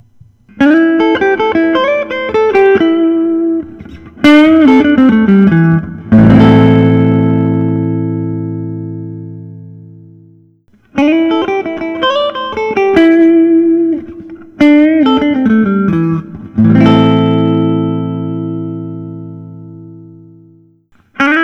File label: artinger semi hollow custom cherry burst